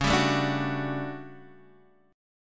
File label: A 13th Db, guitar chord